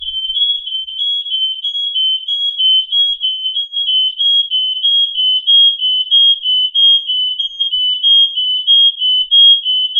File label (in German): hekatron genius plus rauchmelder alarm; Rauchwarnmelders